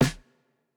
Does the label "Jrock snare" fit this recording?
Yes